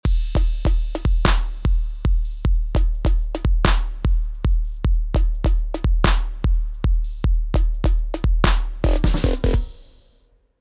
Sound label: Reverb